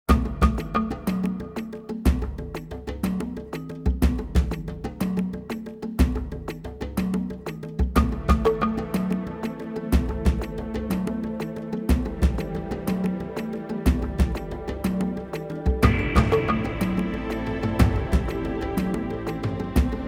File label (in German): Drums Adventure Long Strings leiser